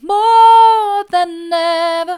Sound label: mid, vocal